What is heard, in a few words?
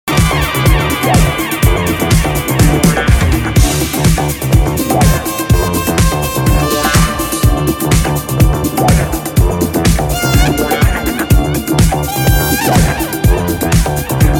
Dubstrumental